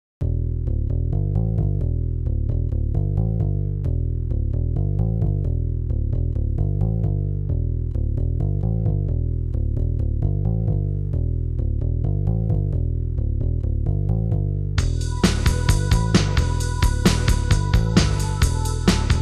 dist moog

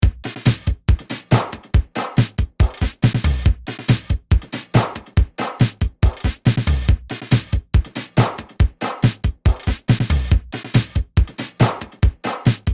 drums

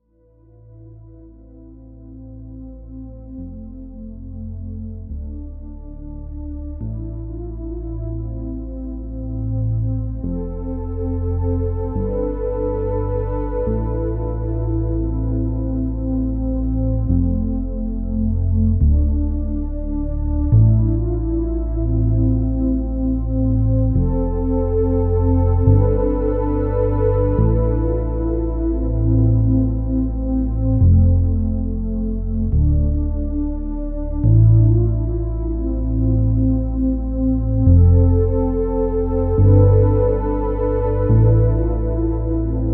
Ocean Drone

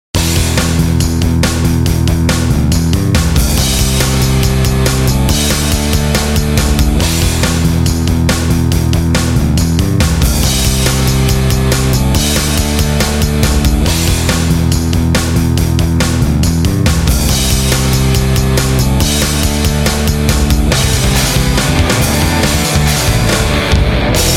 Jam

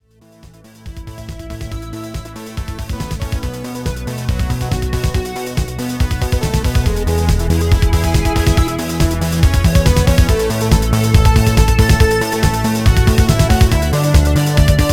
electro

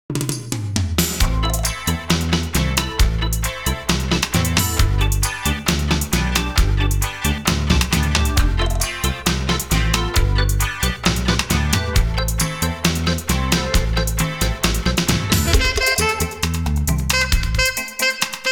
Reggae Spot; Reggae TKR Spot

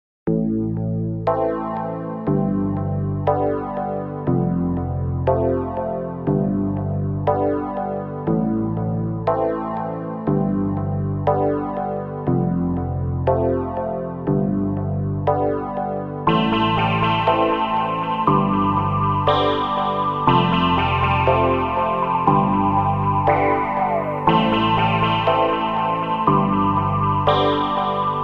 cz trance

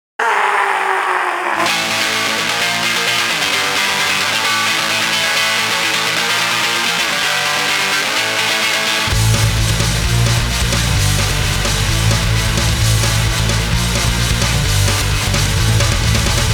Slam Dance

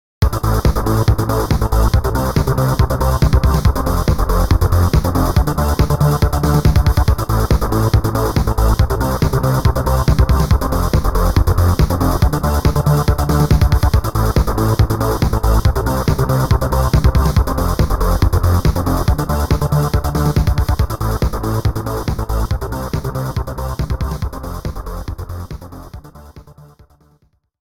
techno junk

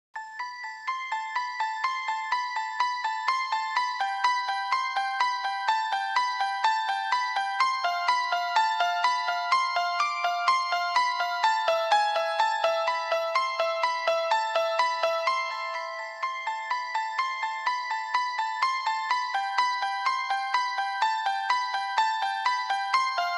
bell jam; exorcist bell jam

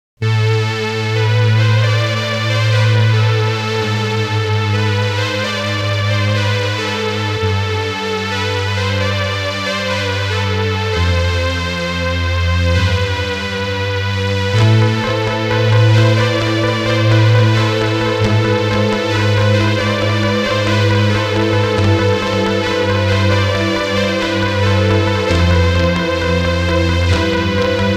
Some Dramatic Ending

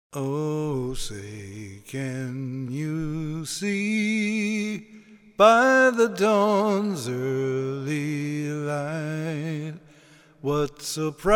vocals

